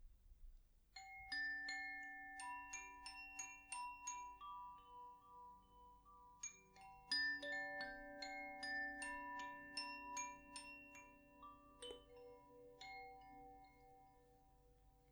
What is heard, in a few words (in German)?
feuer koshi klangspiel